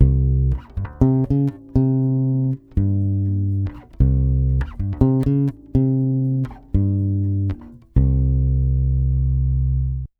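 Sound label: 120 BASS C minor 7, Brazil Bossa; USB Soundscan; AKAI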